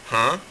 huh; Exile III v1.0

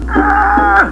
Bear "ergherghergh"!

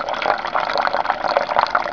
Kiss; Dubbelmoral!

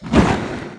FLAME ON, FLAMETHROWER; Messiah; WEAPON

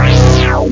burp; Ultimedia 2; sample creator

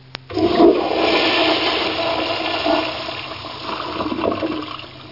FLUSH; Dark Forces GOBs; Operation Nitehawk

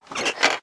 make chariots; Immortal

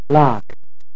Lock; Vistapro 1.08; F-16 Betty

